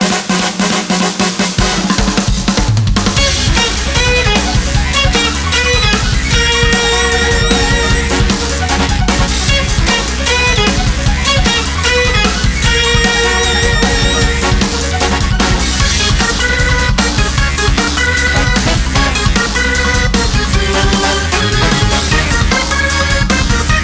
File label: bgm boss